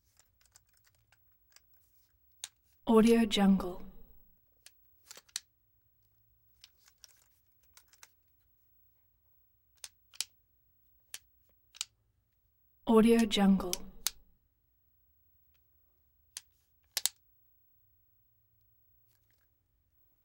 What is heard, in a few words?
flashlight on off